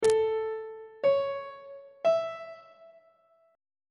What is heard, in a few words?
c flat minor chord 7 is b double flat major on treble clef